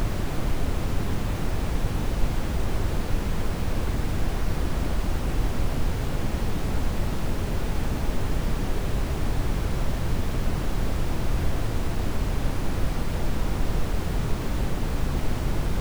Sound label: Brownian Noise STEREO -23LUFS non-coherent L&R 44,1kHz; Fair loudness-based measures for live events